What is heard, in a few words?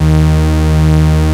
Moog modular; Greytsounds VOL-1